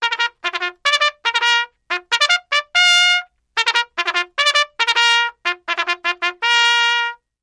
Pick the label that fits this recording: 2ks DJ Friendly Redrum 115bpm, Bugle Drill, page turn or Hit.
Bugle Drill